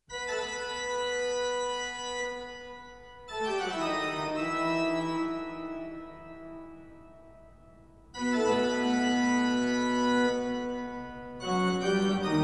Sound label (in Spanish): toccata y fuga; bach; el barroco